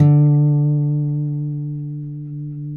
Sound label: Finger D 3 L, Guitars; Spectrasonics; Hans Zimmer Guitars VOL-1